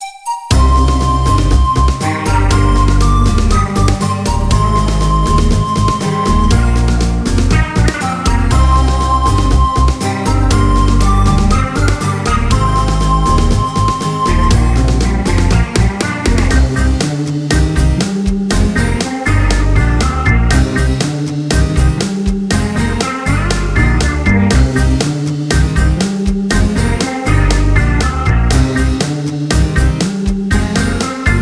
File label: Retro; Zenonzard